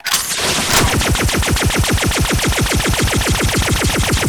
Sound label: laser; combat; airpod